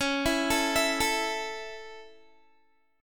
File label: C sharp Minor Sharp 5th down Guitar; C#m#5; arpeggiated